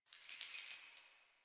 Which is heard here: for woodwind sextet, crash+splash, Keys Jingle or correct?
Keys Jingle